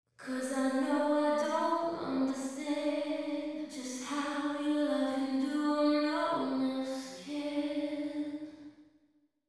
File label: Wet But Upfront, Natural Verb, Solo, lead vocals